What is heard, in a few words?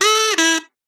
kazoo short fall